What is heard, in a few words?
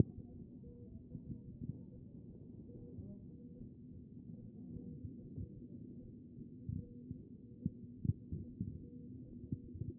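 anterior chest, position 1; B MODE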